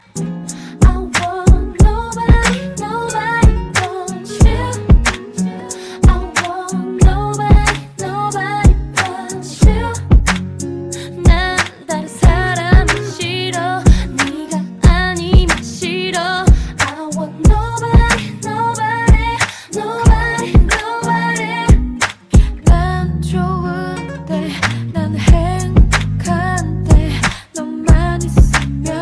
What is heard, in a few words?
Acapella